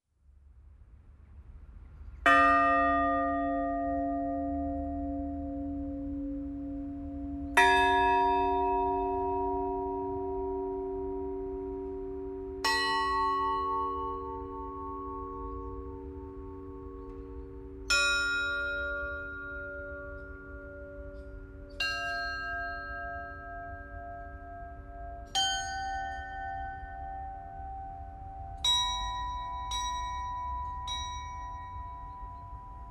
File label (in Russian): Колокольные звоны, Водосвятный перезвон; Колокольные звоны Татарстанской митрополии